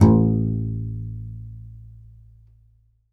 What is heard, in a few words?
C. Bass Balalaika, Plucked Strings